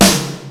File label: RY-10, DRUMS + PERC, YAMAHA